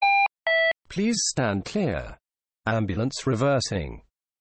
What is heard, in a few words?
Ambulance Reversing with Beep Noise